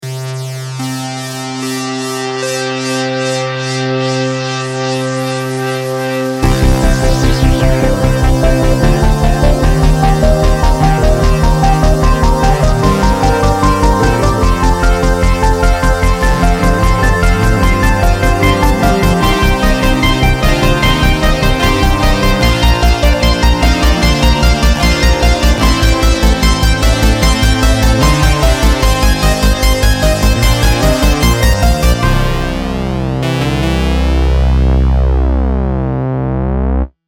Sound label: MOOG